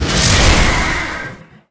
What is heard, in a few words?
chaos strike; weapons; dota; chaos knight